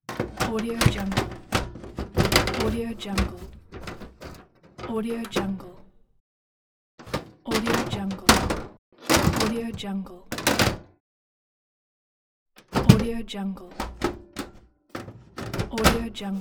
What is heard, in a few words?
metal cover sound effects